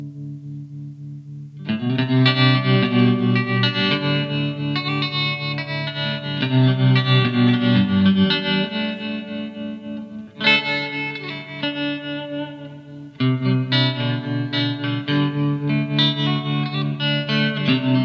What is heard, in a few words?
clean trem, Tremolo